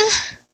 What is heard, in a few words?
Moan